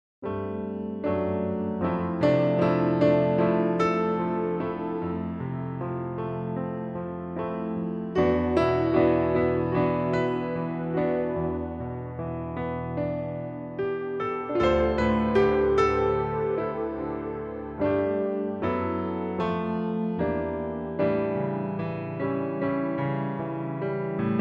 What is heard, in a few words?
piano